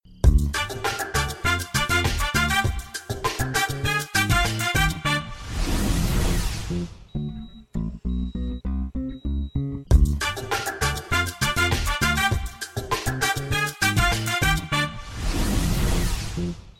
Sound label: Comedy BGM; Aadu Oru Bheegara Jeevi Aanu 2; Malayalam